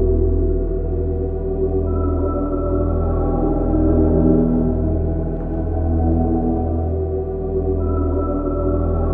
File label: AMBIENT; MELODICS